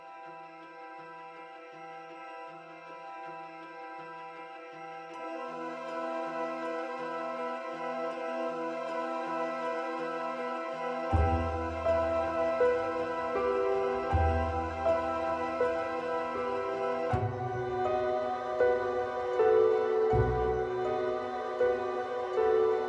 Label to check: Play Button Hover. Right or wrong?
Wrong